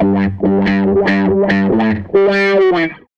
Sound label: Les Guitar Loop